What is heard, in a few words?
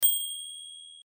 tty bell, ASR33; PDP-8-E-Simulator; Macintosh